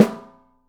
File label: OPEN PICOLO, 3D Drums